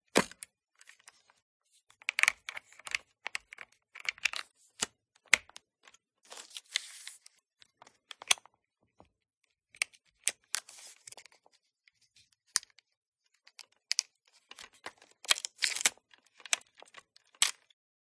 camera film 35mm slr canon a1 film loading feeding advancing purging sequence; mkh8060; PMSFX